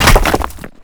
rock fall break softer; Explosions; Call of Duty Modern Warfare

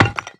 brick; Call of Duty Modern Warfare; physics

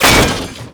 car door rip

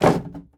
sign post deploy; Rust

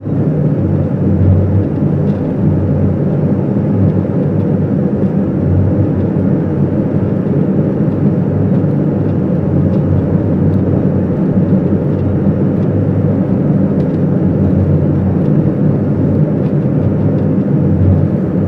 ship engines; World Of Warships

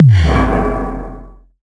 perk shield; World of Tanks Blitz; GUI halloween 2018

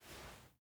ironsights up; Rust

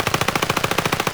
mpx outdoor far loop; Escape from Tarkov

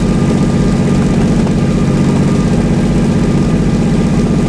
cromwell engine rpm load; cannons; War Thunder

